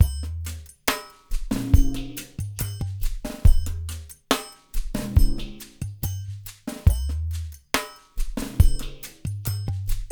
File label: DRY MIX; Spectrasonics; Liquid Grooves 1 & 2; BUBBLY